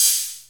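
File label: Casio MT-100 hi-hat open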